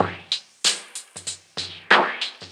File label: Old School Break, Drums